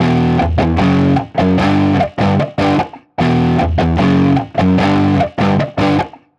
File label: 150BPM Guitar Heavy Emin; Antidote Advent